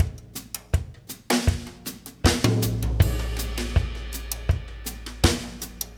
Russ Loop, Laidback; Sonic Foundry (Sony Creative Software); Loops & Samples Drum Tools

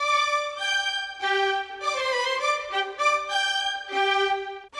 Violins, Orchestral; Sonic Foundry (Sony Creative Software); Orchestral VOL-2

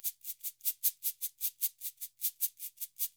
SF LOOPS 76, Percussionworks; Sampleheads; NYC Percussionworks

